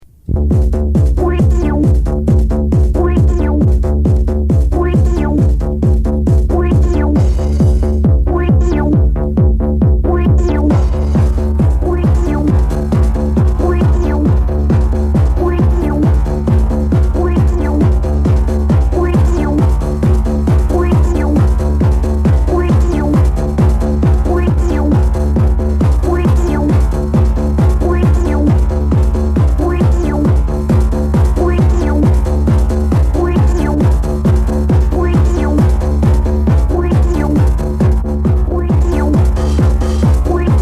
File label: TECHNO SONG